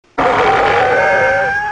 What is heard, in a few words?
roar; miclas